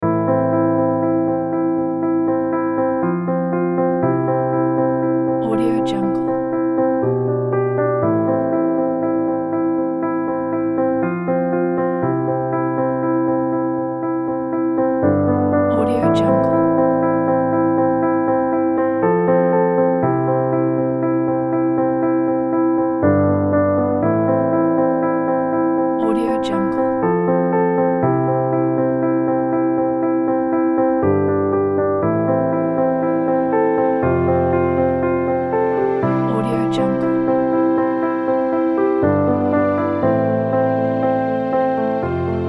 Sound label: romantic piano inspire